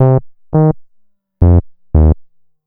Bass, Futurist Drum & Bass

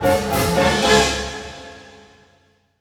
HIT, full orchestra; Orchestral Loops; FULL ORCH ACNT

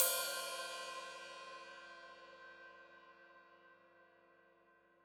Hat Open, Hi-Hat; Deeply Sampled Drums

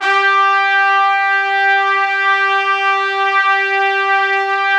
Orchestral Brass

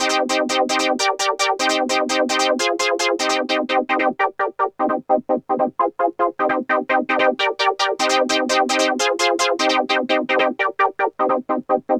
FILTER TRUNK, 150; Masterbits - Tekkno Trax; SQ LOOP 150

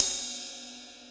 kit, Yamaha Motif-ES8

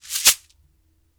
Berimbau Shaker, World Percussion; Sony CS

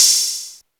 HAT

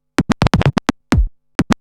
Scratch Fills 140BPM; Vengeance - Essential Clubsounds Vol.4; Scratches

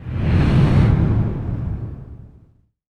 IMP FLAME, Cinematic Impact

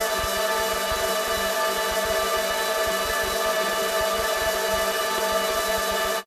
granular; pad

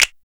HARD SNAP; SNAPS

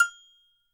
AGO HI, Percussion; Sampleheads; NYC Percussionworks; ST PERC VOL2

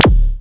Zapps, sci-fi

